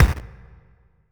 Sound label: Distorted Bd, Kick Drums; Zero-G; Soundclash